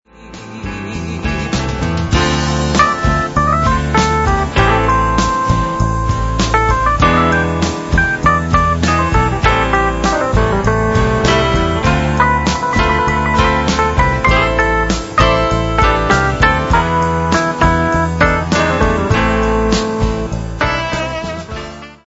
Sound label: piano